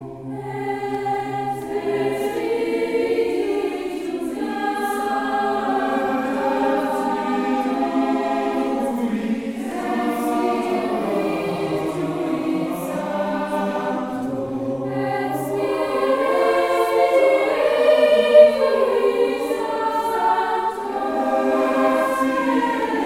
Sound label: Palestrina Magnificat